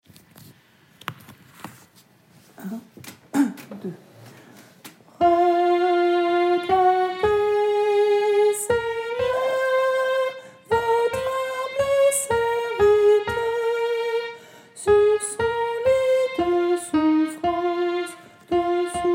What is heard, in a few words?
La PDM Guide Soprano